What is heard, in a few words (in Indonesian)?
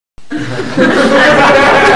ketawa; bajuri